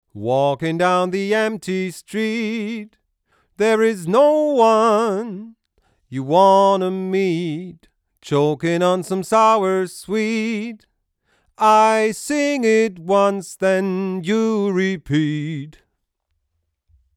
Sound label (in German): Lang PEQ 2 Vocal ohne EQ